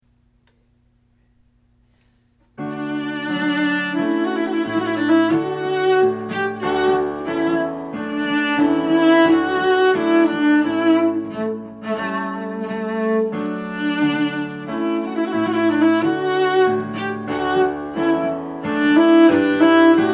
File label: trumpet voluntary